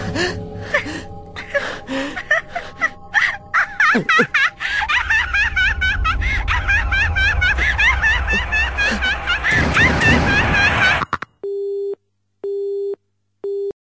laugh collection

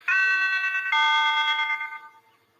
rhyme chime

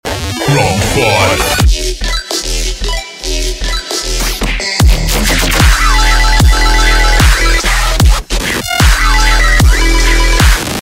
remix

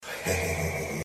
hehehehehe

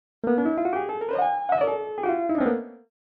piano rumble

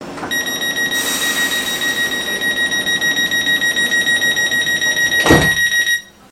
train doors

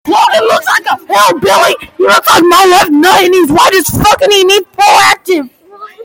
reee

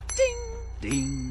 ding ding

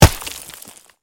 dirt

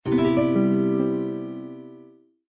new message

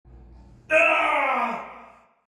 arrrrg

